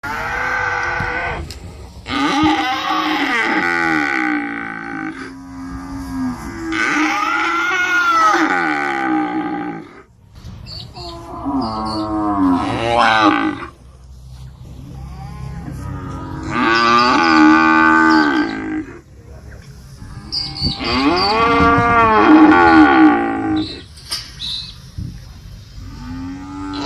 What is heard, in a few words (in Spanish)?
SONIDO DE VACA